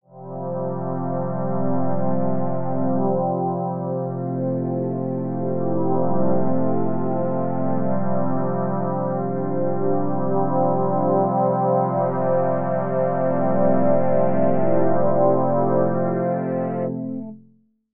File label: Ableton Wavetable PAD; Whats The Vector